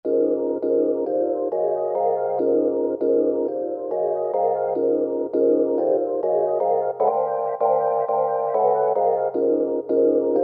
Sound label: UK garage; Roland SRX-05 Supreme Dance